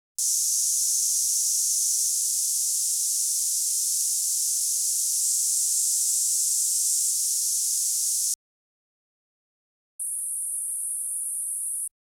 6.3khz, 8khz, 10khz -20/-16db L/R, 1/3 oct. Band Noise, R 1/1 oct. Band Noise; Denon Audio Technical CD